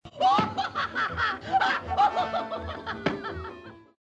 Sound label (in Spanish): risa